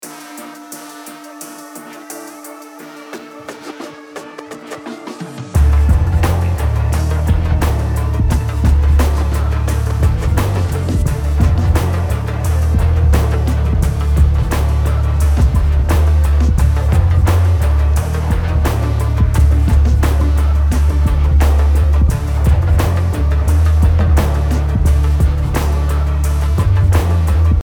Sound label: trip hop